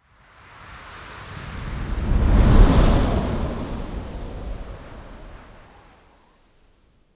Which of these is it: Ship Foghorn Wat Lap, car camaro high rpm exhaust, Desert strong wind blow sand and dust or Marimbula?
Desert strong wind blow sand and dust